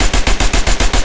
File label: loop vickers303 origin; guns tanks; War Thunder